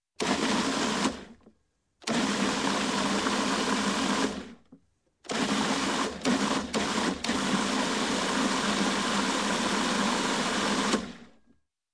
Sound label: Food Processor Mixing Liquid And Dry Ingredient; Sound Ideas 2000 Series